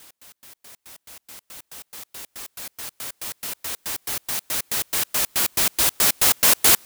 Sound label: 140 FX LOOPS; Dubstep; Loopmasters; The New Sound Of Dubstep